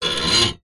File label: industrial bolt thread rub scrape on metal resonate